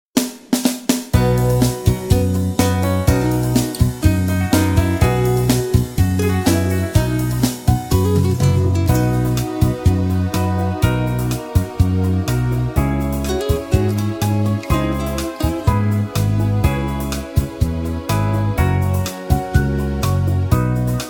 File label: (B To C) No Backing Vocals